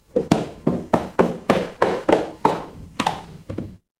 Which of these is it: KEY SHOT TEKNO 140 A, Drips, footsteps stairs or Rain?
footsteps stairs